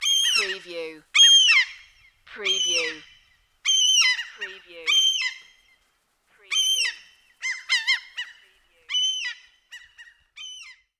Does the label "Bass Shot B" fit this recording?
No